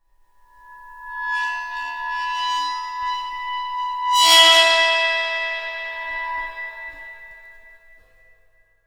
Cymbal; Percussion Samples

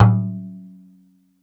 Def Hi, Def Drum; Percussion Samples

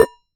Damped Hit, Glass, Large Wine Glass

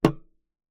Key Noise, Melodica

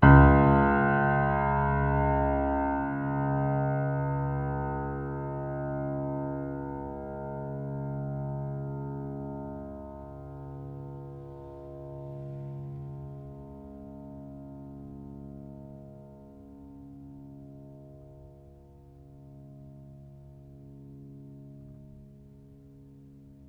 Note, Piano; Piano Miscellaneous

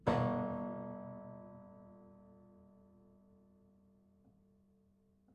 Prepared Piano

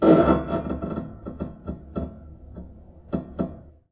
String Rub Erratic, Piano; Piano Miscellaneous

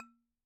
Bottle Struck

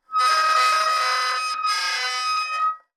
Soldering Iron Stand Drag

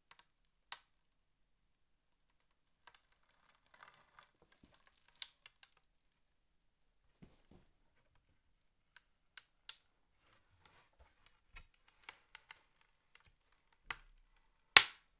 Palette, WoodenPalette

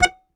Very Short Note, Melodica

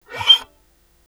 Violin Extended Technique

